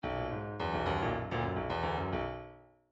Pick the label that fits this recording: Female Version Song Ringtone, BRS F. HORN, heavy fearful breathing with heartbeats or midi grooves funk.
midi grooves funk